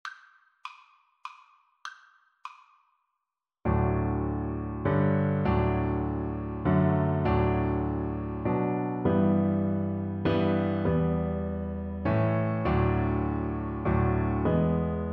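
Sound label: viola karaoke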